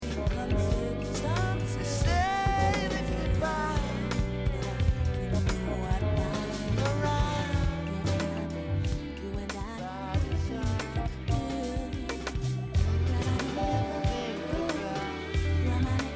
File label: Groove